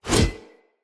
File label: Whoosh, Swords; Weapons; Mockery; Mockery Brazard